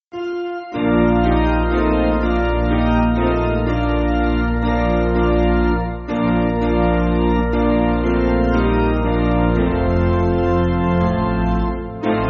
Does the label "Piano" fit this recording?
Yes